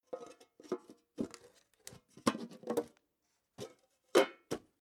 Metal Object Paint Can Tin Of Paint Replacing Metal Lid Various Movements; Tom Hutchings